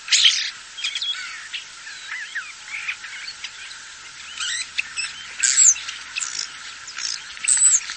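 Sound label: squeak and cry of several starlings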